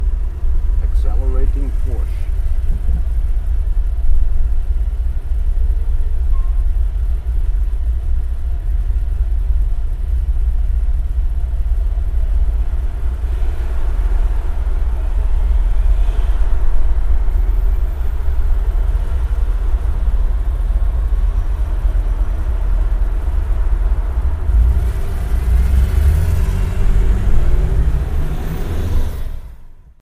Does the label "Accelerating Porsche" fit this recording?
Yes